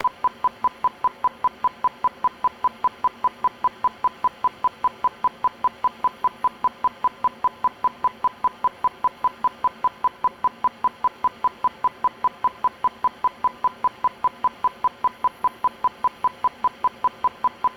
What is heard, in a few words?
burst 32ms 1kHz; CESI; LaSpezia